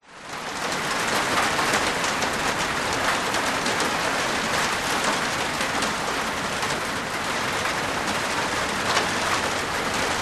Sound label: rain medium heavy large drops on plastic veranda roof light dist traffic; nature; occ birds